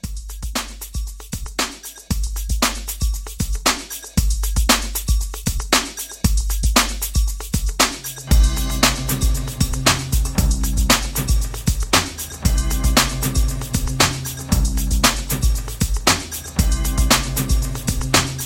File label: Christmas 80s Holiday Re Drum 116